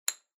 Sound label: Pencil Put Down